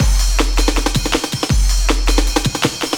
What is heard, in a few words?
Blue Break, 4A, Jungle Breaks; Blu Mar Ten - Jungle Jungle - 1989 to 1999 Samplepack